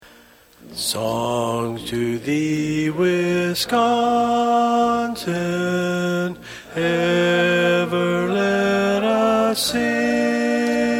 Lead

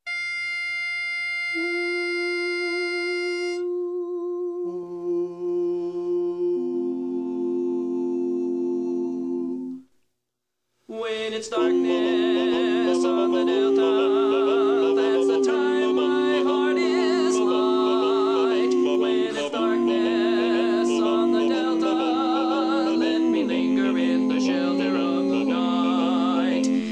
Tenor

